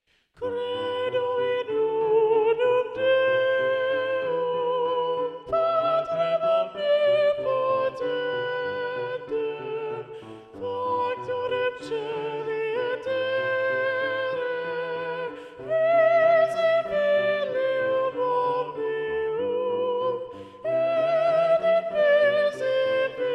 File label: Soprano; Mass No2 in G Mvt 3 Credo; Practice Room